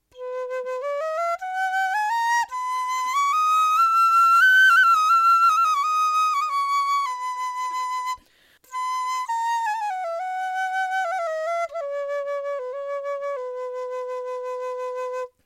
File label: dizi c